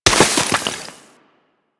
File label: Melee Impact Snow; Warframe; Weapons